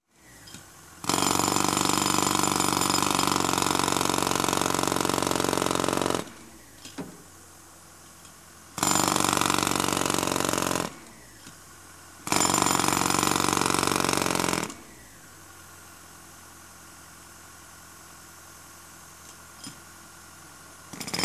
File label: Jackhammer on concrete